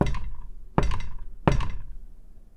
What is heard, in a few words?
knock on glass door; knocking